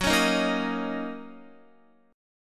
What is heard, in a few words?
Fsharp 6th down Guitar; F#6; strummed